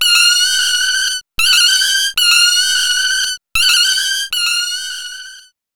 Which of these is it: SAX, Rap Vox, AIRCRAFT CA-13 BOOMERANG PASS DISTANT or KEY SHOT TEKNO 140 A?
SAX